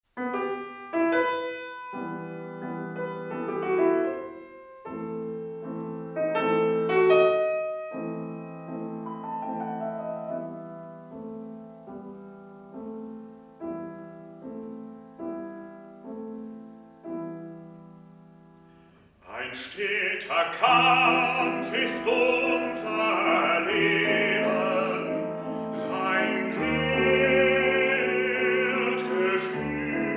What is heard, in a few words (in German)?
Klage